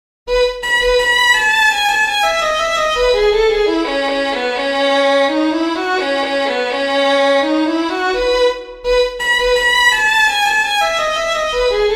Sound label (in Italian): cinque preludi per violino